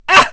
slide

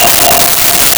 Vibes F5; Nintendo; July 2020 Gigaleak

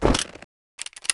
pistol melee

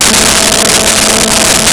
Cello A3; Nintendo; July 2020 Gigaleak

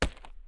walk, footsteps hard surface; elite footsteps

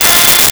Bandoneon F5; Nintendo; July 2020 Gigaleak

